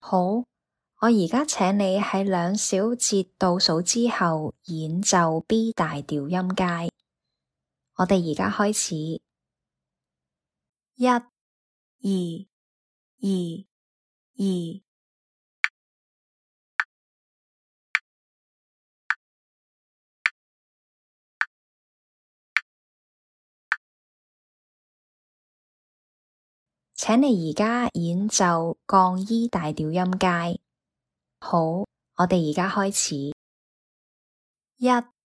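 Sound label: B major scale E flat major scale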